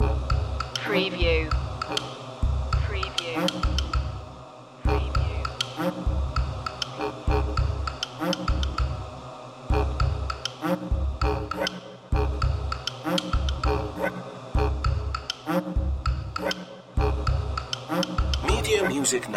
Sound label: australian ancestry loop; aboriginal music